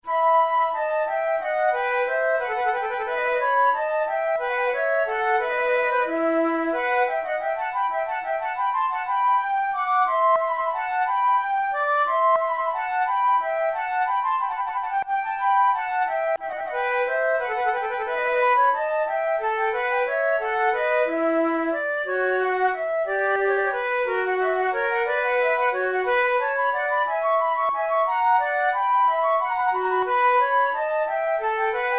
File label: two flutes air